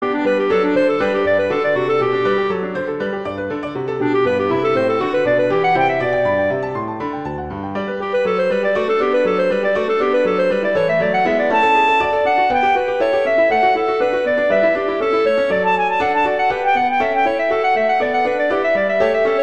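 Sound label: Toccata, Viola Piano; P.D. Paradisi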